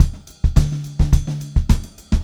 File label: VAR, Funk-Groove Drumloops